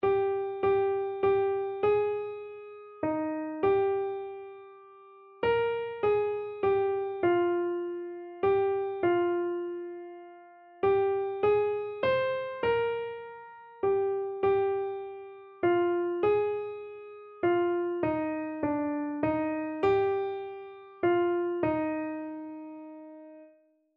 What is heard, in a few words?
keyboard